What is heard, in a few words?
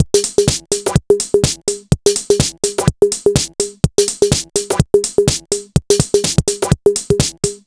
Digital Breaks, 125 BPM; Loopmasters; Jon Carter & Alex Blanco; Block Party Essentials